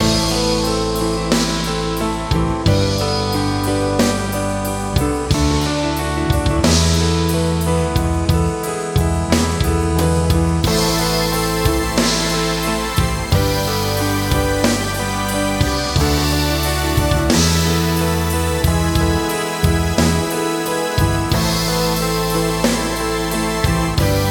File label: Backing Track without lead guit bpm 90; porzione base stereo senza voce e assolo chitarra, file wave 44.1Khz 32bit